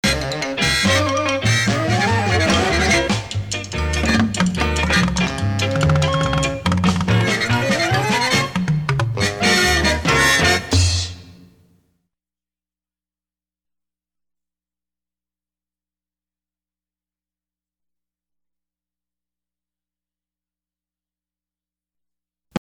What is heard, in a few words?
W X Y Z Jingle Instrumental; Pams